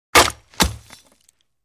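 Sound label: Tomahawk Shield Block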